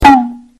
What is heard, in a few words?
spatial bamboo hit